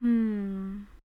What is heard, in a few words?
Hmmm